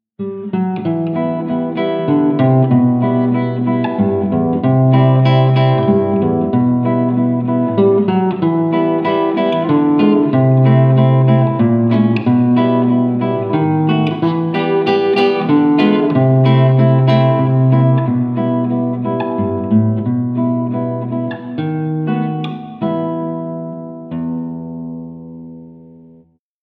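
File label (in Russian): Klassicheskaya gitara, classical guitar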